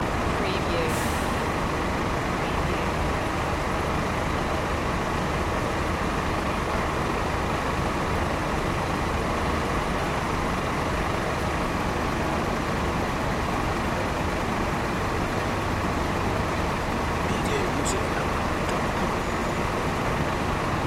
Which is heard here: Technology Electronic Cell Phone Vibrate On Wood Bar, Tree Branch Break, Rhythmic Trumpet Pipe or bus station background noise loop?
bus station background noise loop